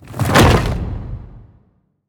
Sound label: spiders bossfight claw close